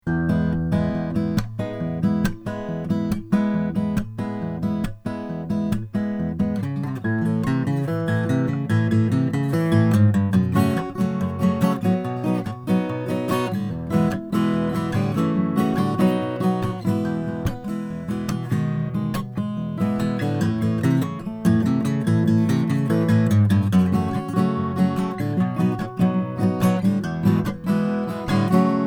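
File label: martin indian rosewood; paul simon ltd ed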